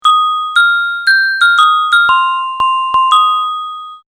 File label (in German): pfadfinderpfiff xylophon; Klingeltöne